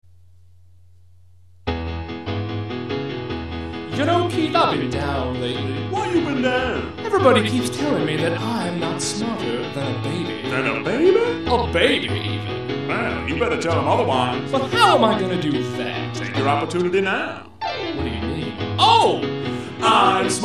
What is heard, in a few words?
Baby